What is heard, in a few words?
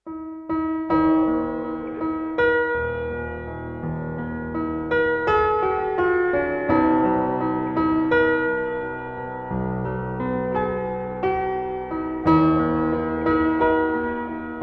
Slowed